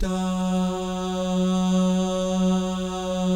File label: DAH, jazz FX, mix guy gal; Spectrasonics; Vocal Planet